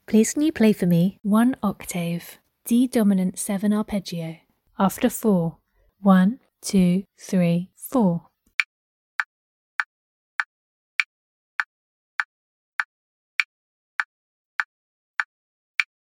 d dom 7 arp

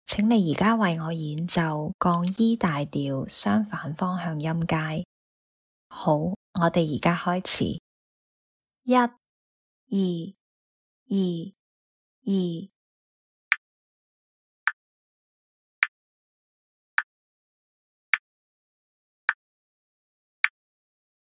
E flat maj cont motion scale

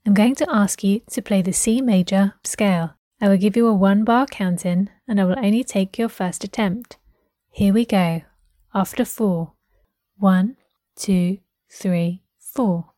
c major scale

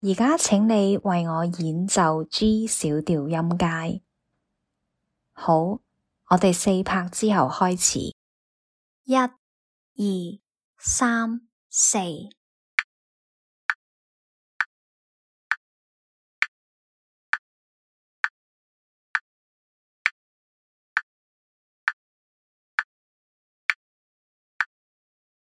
G minor scale

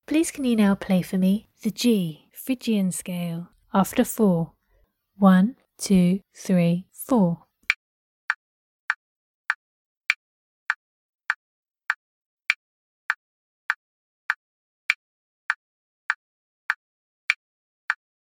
G phyrgian scale

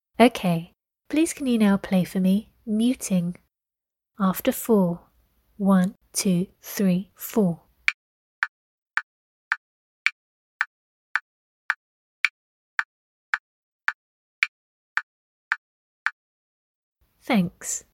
Muting

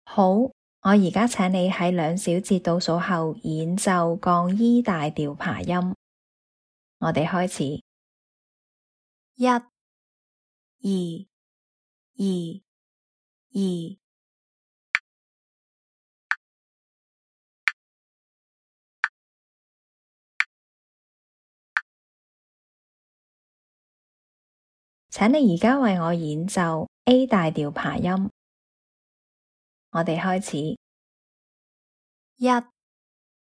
e flat maj arp a maj arp

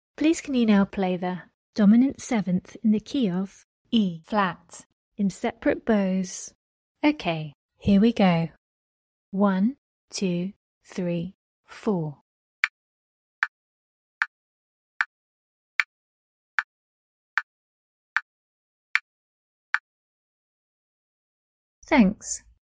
dom 7th key of E Flat sep bows

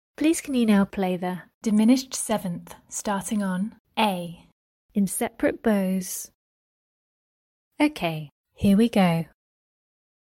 dim 7th starting on A sep bows

